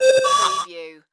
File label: Notification Beep Distortion Ascend